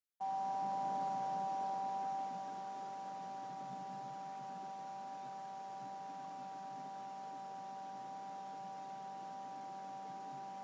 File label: ThinkPad L420 Fan Noise; surrendes lüftergeräusch; gelöst surrendes lüftergeräusch l420